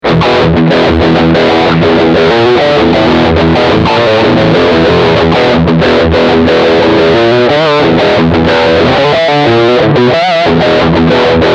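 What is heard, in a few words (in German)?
Riff Distortion Steghumbuck, guitar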